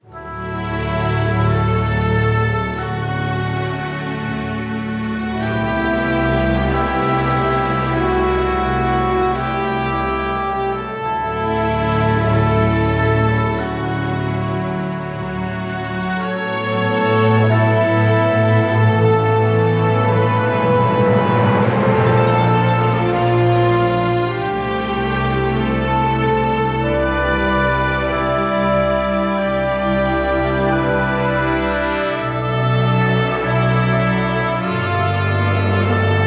Open World, BPM 94, D

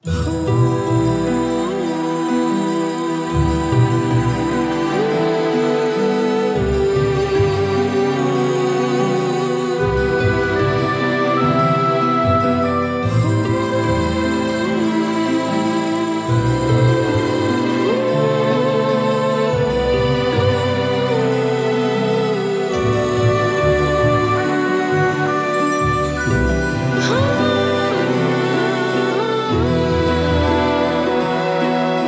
Game Menu BPM74 Em Voice; 4/4; Voice + Strings; Game Menu 6 – Voice.ver –

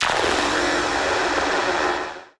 chimaira

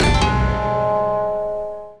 japanese traditional

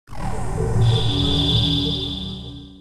use magic

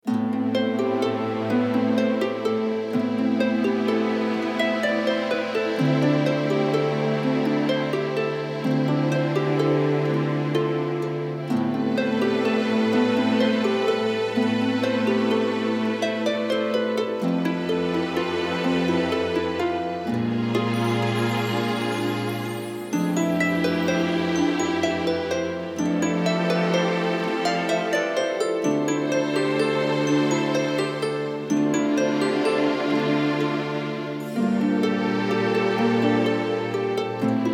Game Menu BPM84 Am, Free Music

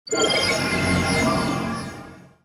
wizardry

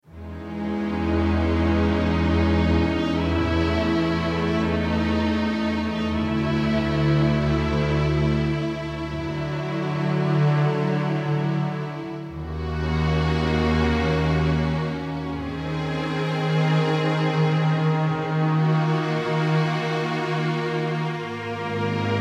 Field BPM81 E, Free Music

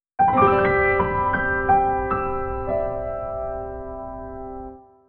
Jingle Piano

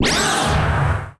wivern

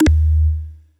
button

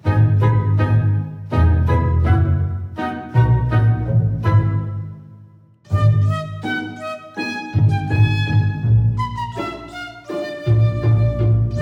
Village BPM82 Abm; 4/4; Woodwinds + Strings

